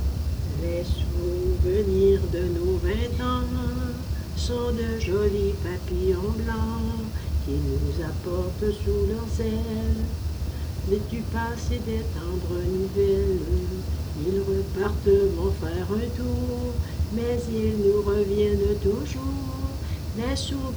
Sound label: singing